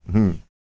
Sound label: LAUGHS, Jazz FX, Vocal Planet